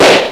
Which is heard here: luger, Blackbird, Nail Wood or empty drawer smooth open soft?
luger